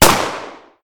Magnum

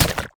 Perf Hive Hit

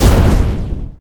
Providence Holy Blast Impact

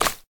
Mine

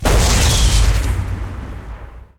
Tesla Cannon Fire

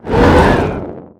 Hive Mind Roar Fast

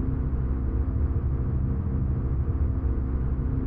Brimstone Monster Drone

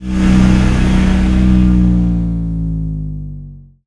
Ares Circle Laser End

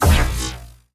Charge; Arc Nova Diffuser

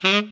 Sax

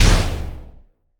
Brimstone Big Shoot

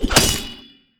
Scissor Guillotine Snap

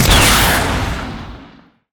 Shot

